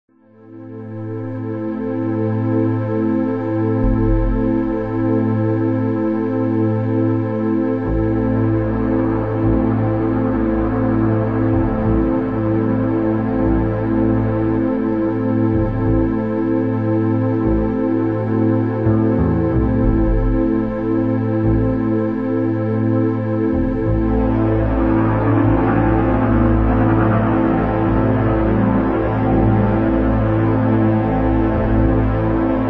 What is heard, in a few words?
score sample; Voices from the High School